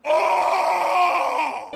aaaaaaggghhhhh; flapjack

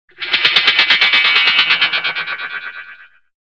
sci fi singing sword

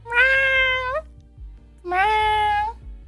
cweam meow

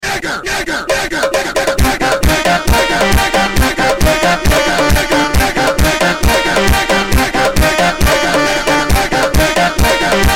funk

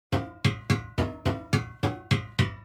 ladder

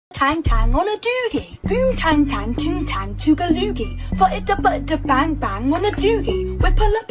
heheheheh

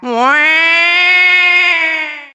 death; waluigi

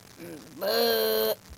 buuuuuhhhh

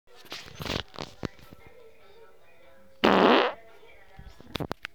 zooooooorttt

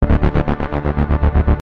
tense pulses

